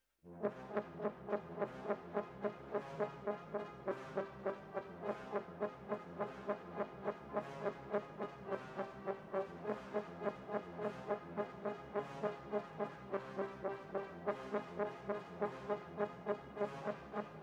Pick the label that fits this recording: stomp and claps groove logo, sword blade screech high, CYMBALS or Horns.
Horns